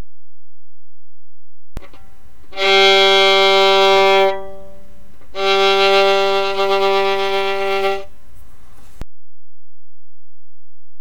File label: half vibrato